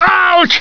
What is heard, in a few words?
hurt